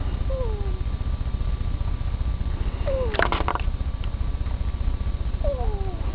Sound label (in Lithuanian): knarkimas knarkia, ferret